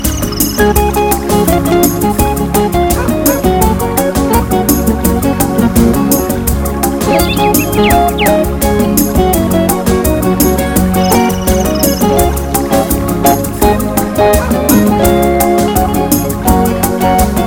Great Bossa Nova

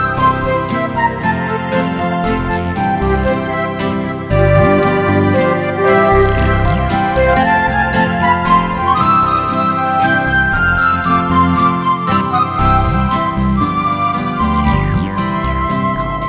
Beautiful Serenade

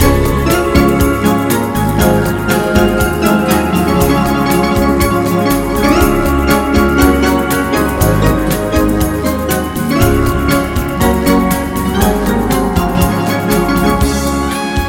Wonderful Cha Cha

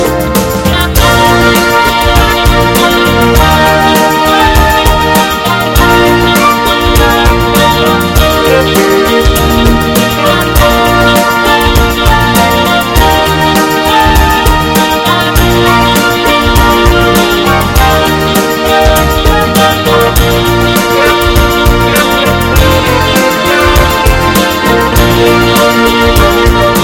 Smooth Classic Pop

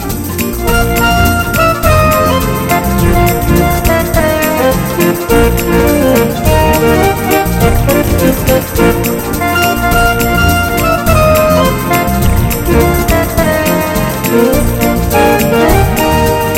Beautiful and Fast

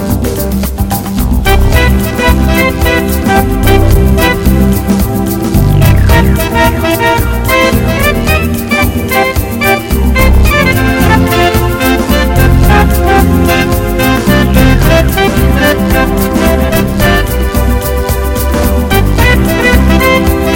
Hot Cuban Jazz

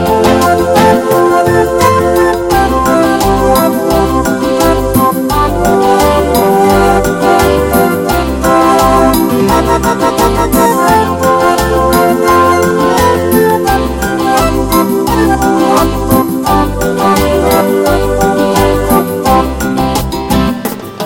A Beautiful Limbo

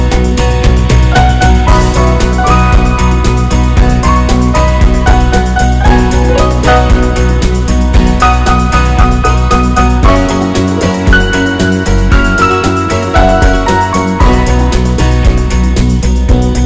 Quick Hot Rock

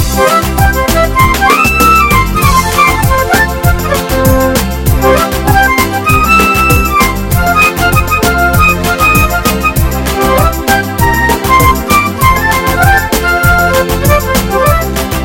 Quick and Happy

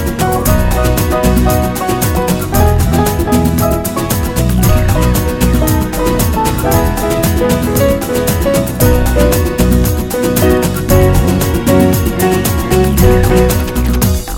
Very Hot Dance

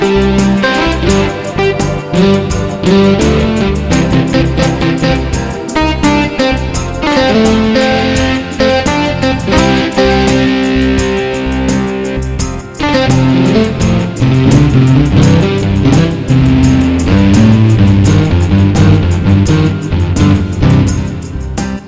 Slow and Smart

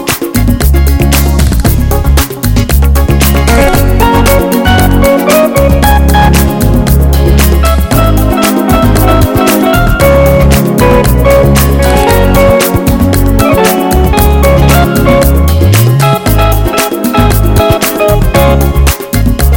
A Smooth Pretty Disco

